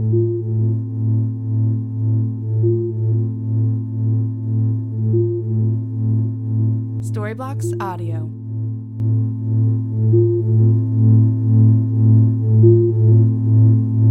Ambient House Drone 136BPM A

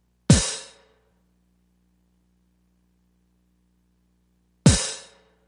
Ambient House Kick Hat 110BPM

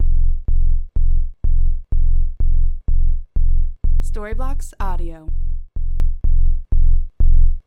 Ambient House Bass 125BPM F

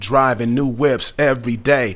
Rap Vox

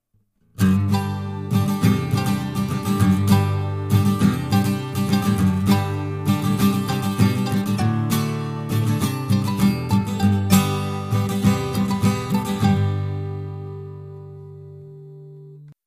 indie uke

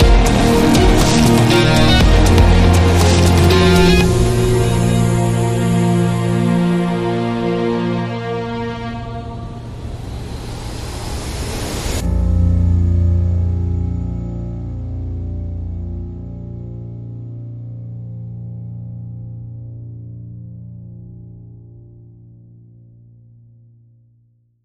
Hybrid Digital Orchestra; bbc

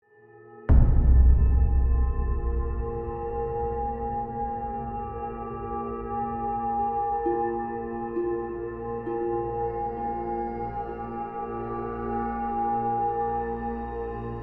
Sinister Plots

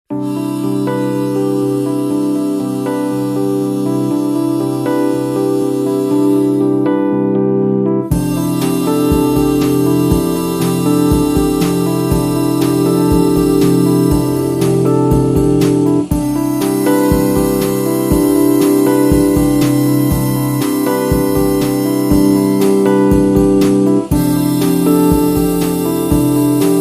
emotional landscape

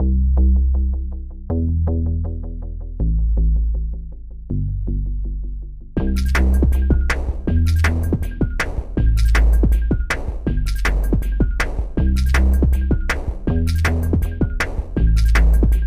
80bpm Drums and Bass

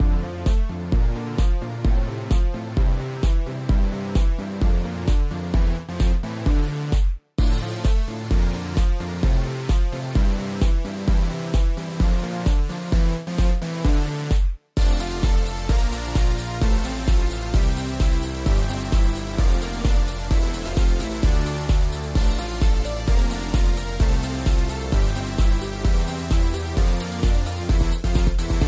130bpm Alt Percussion

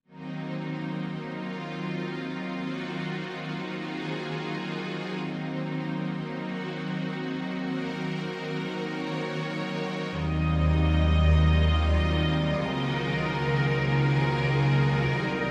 cool hip hop music

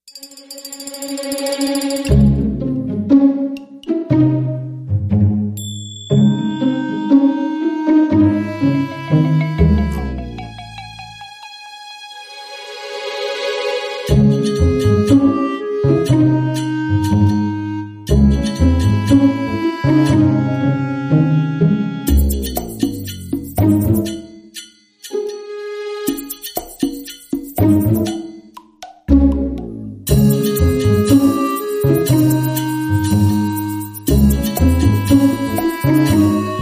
gt pizz comedy

